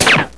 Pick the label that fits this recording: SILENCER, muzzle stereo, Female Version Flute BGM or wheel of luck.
SILENCER